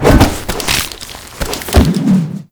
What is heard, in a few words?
Staff Stealth Hit; Warframe; Finishers